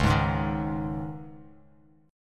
Db Suspended 2nd Bass Standard; strummed; Dbsus2; JGuitar

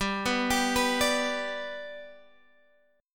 G Major down Guitar Standard; G arpeggiated; JGuitar

